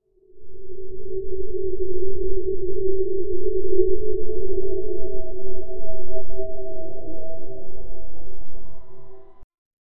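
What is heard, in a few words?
a mysterious magical atmosphere; dark fantasy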